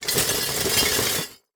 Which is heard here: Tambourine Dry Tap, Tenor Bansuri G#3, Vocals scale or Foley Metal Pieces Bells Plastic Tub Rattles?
Foley Metal Pieces Bells Plastic Tub Rattles